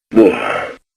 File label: sigh